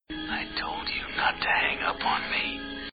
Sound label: scream start